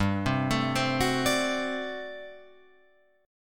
G Major 7th Suspended 2nd Suspended 4th G down Guitar Open F; GM7sus2sus4 arpeggiated